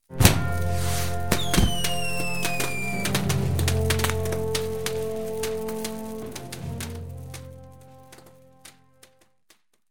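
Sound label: fireworks and salute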